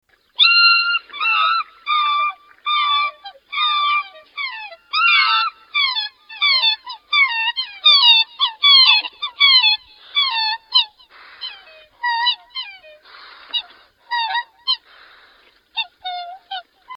black swan

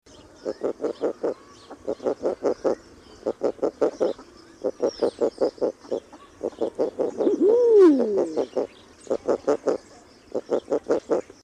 eider duck

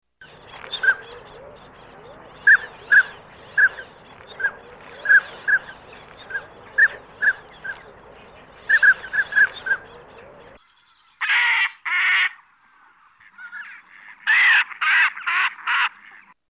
pintail

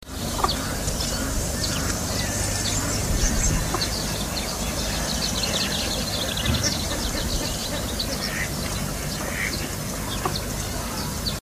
yellow-billed pintail

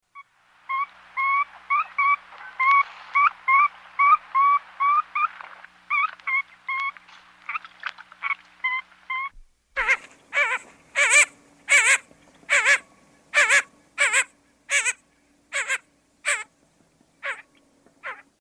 blue-winged teal

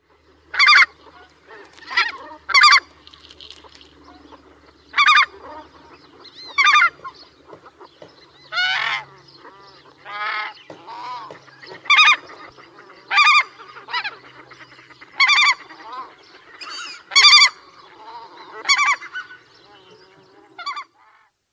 emperor goose

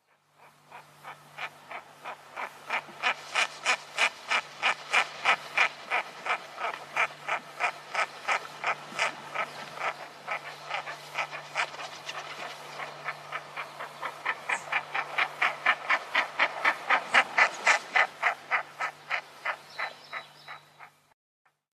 red-breasted merganser

